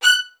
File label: XST1 F#6, LOUD VIOLINS; Q Up Arts; Denny Jaeger Master Violin Library